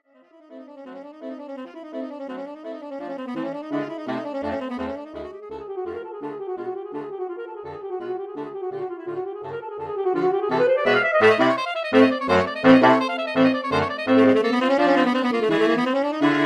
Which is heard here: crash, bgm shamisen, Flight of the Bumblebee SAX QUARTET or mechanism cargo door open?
Flight of the Bumblebee SAX QUARTET